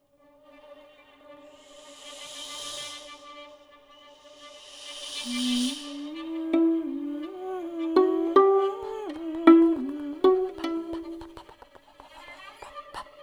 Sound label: Dream Cat Remix